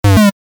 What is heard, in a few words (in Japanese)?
バビュン レトロ